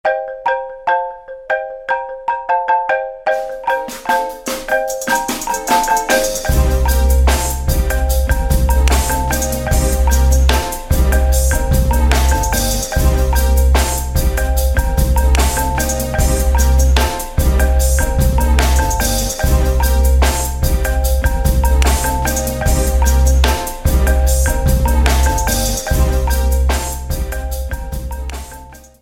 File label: Iphone Xylophone beat